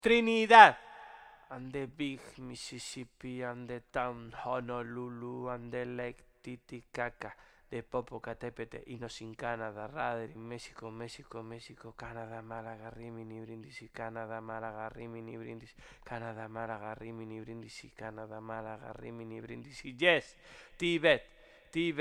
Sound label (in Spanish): FUGA GEOGRÁFICA SOPRANOS